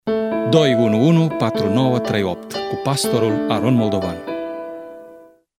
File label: Jingle